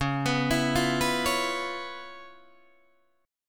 C sharp 7th Sharp 9th, C sharp, down, Guitar, Open D